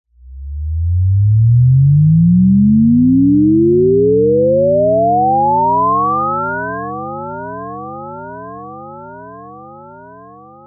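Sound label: cartoon sci fi rise laser whistle delayed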